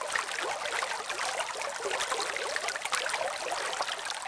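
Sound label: water flow loop; water splashes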